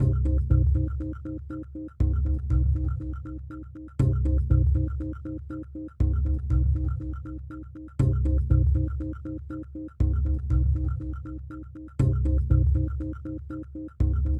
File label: Automatic Pulse